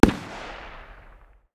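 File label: light machine gun 762 medium distance; tacz-tweak-pack; taczmechanics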